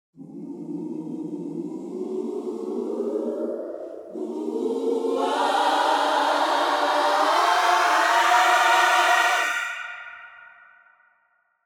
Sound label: ascending swell bvo; anise glide reverb; audio spices